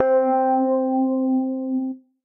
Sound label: Dan Piano; Piano & Keys; Ableton; Factory Packs; Chop and Swing